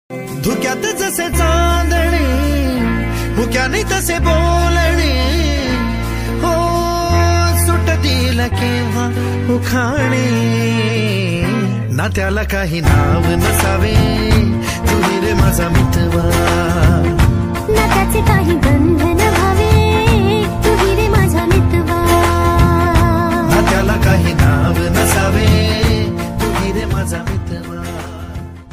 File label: Marathi Ringtone